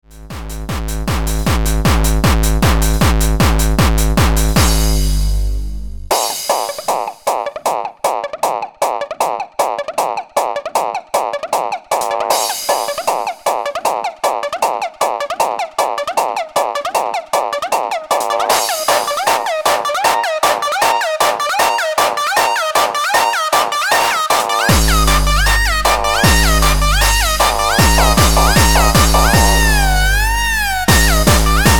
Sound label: Hard Beats Instrumental